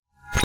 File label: Battery Plate Magnetic-Snap Rev; viewfinder